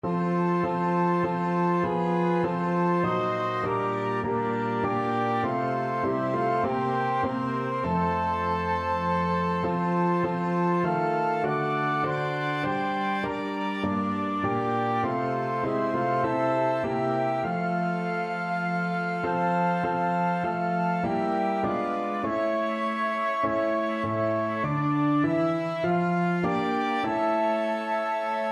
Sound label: little town us, flexible ensemble and piano 4 players and piano